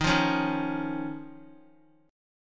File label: D sharp 7th Suspended 2nd, Guitar; D#7sus2 strummed; JGuitar